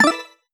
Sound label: menu click; Crimson Stella